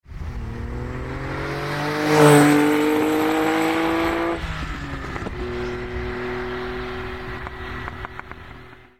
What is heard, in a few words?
ferrari dino